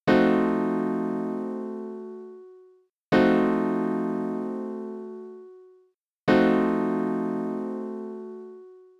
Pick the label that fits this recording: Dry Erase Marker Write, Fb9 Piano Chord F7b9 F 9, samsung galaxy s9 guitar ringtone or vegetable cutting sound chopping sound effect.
Fb9 Piano Chord F7b9 F 9